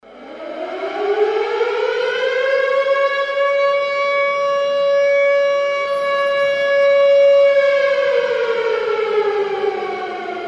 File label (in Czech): sirena